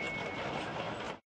floyd move, monsters

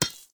rock knife hit, weapons, knives

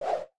miss, weapons barehands

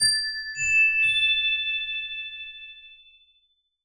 quest up

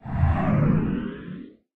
reaper die, monsters

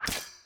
hits

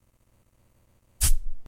spawn, monsters tortuga

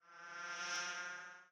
move, bee

